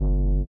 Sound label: LIQUITAR; Spectrasonics; Metamorphosis